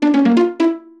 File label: jingles pizzicato; kenney assets